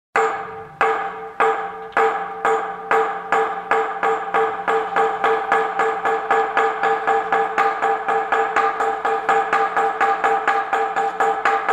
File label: Semantron